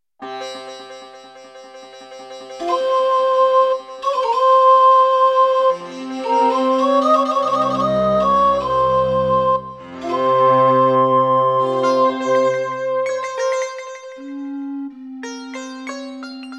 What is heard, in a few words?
Roland SD-90 GSMODE; Prince of Persia 2 - Intro